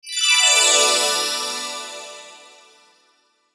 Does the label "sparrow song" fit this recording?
No